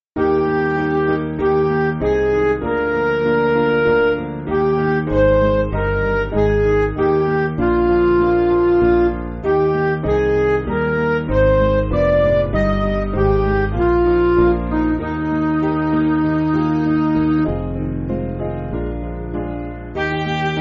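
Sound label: Music Backing Files for Churches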